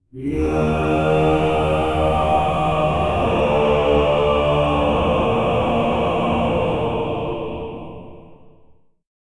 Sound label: a synchronized roar of 30, warriors